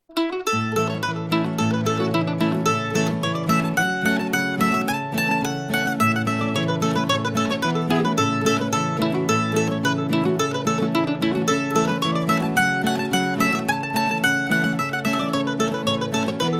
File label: mandolin